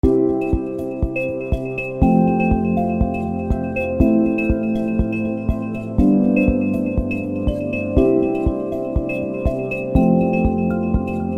Sensual, corporate music; corporate music vol 3

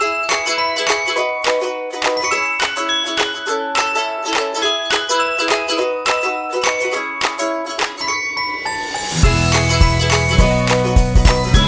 Make Happy, happy music; happy music vol 8

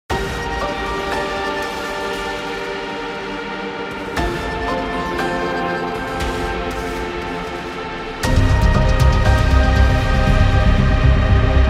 Gothic Metal; gym rock

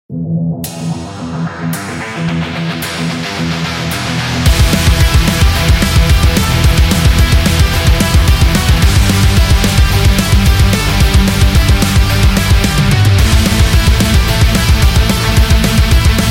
Fast Rock

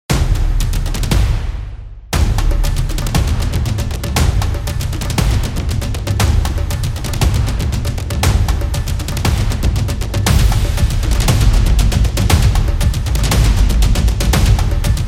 Tribal Drum Cinematic Percussion; battle drums

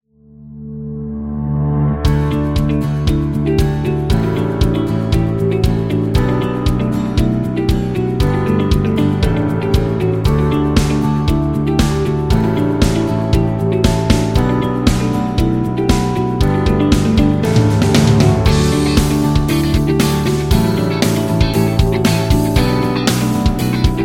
Motivation On; agency promo

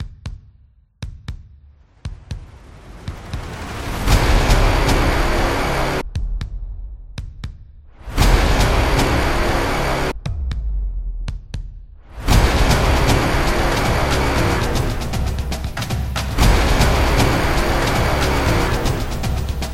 Sport Epic

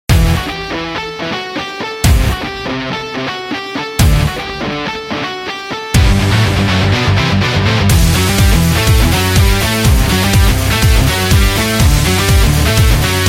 Melodic Power Heavy Metal

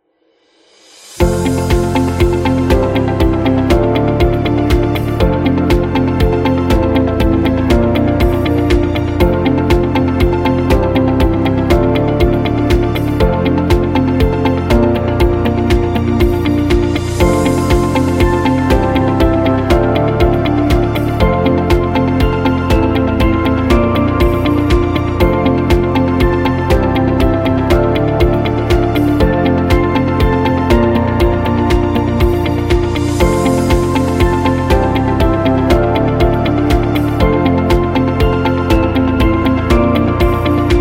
Happy People

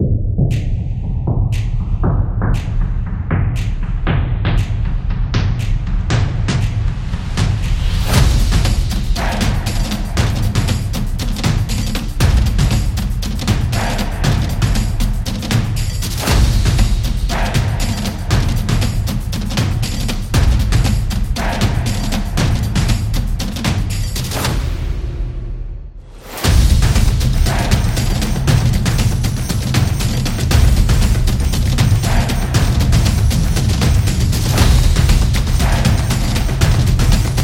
Stomp Beat; graffiti

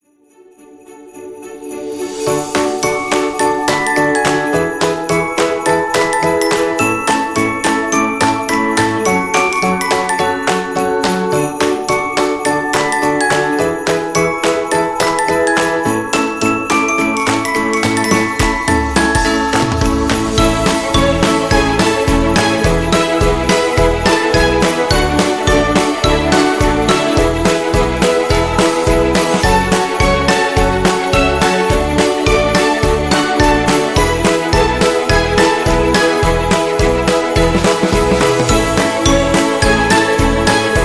Snow Song, christmas

